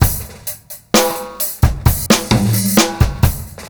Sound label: beat, Roots Funk; USB Soundscan vol.41; AKAI